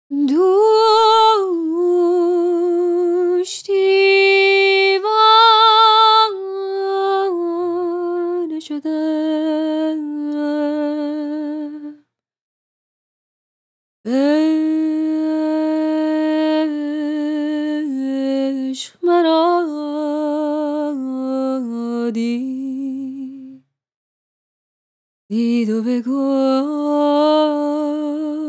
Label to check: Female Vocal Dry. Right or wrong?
Right